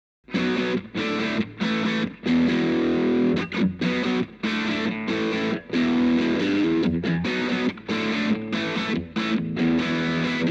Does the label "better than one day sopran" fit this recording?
No